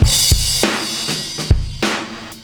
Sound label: fx, Beat Loops, 098; Attitude; Zero-G - Monster Beats